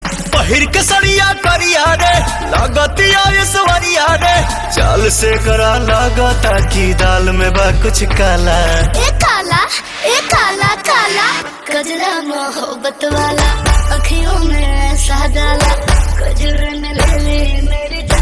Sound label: Ringtone